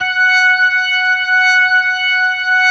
F#5S, B3, Vintage Keyboards

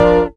CHAP CHORD, Chapman stick; Greytsounds

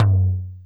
RSF DD Tom, DD-30

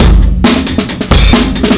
155, breaks

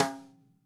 Radio King Edge, Snare One-Shots, Drums; Sonic Foundry (Sony Creative Software); Vital Drums

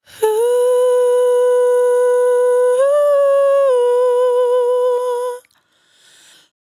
100bpm Bm, Velvet Vocal; Zero-G

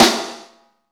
SNR, Snare; Ext.Snare; Club-50 - Foundations

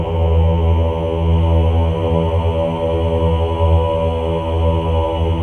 OH E2, CHOIR OHS; Spectrasonics; Symphony of Voices

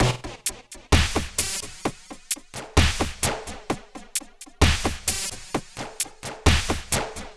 Octave Down Loop 130BPM, DrumLoop Ghost Layers

